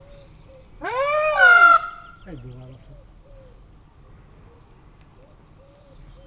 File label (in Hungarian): sikeres átverés elsőre, páva; pecsi zoo 2017